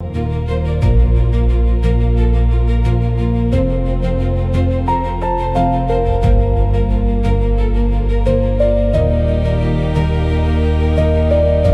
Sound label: loop; bgm